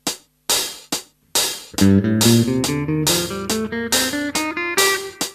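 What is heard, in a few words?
natural minor scale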